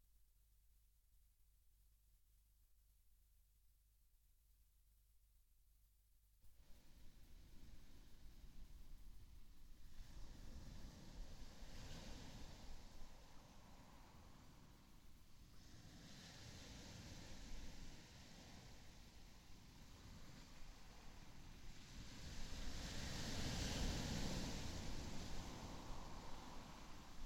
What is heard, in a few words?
ambient waves from salthouse beach